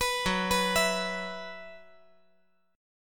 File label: F sharp 7th Suspended 4th